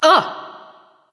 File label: nv surprise; bellona